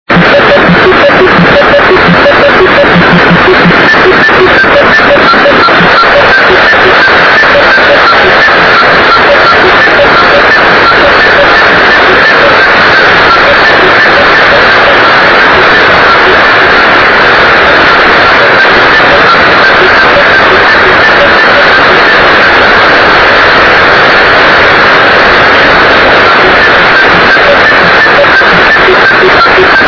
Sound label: polytone; Unid DTMF/polytone, 2010-02-03